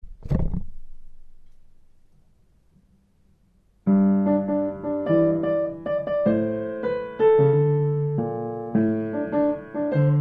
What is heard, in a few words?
Swingy